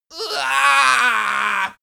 imp die; schreie

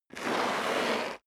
step sneaker concrete grit slide long; schritte

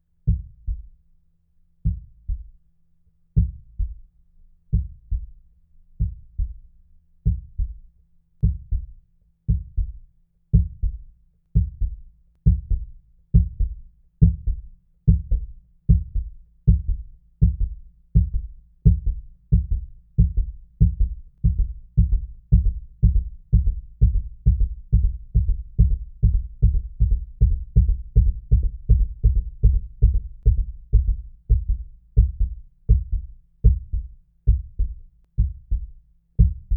heart beat full sequence

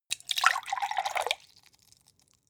glass pour; soundpool

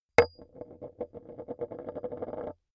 metal impact wood light rolling

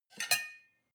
cutlery take from plate; berufe; gastronomie; soundpool

